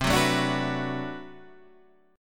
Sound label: B Minor 7th C, chord